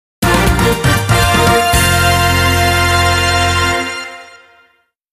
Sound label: Music Success event